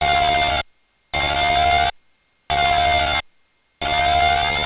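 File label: Emergency Alert System Alt Intro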